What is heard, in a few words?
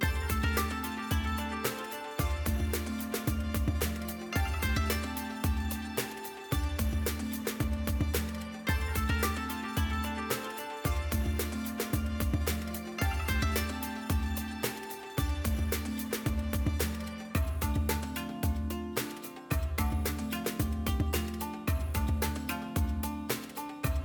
BGM Free Spin, SLOTS; Panda Majesty2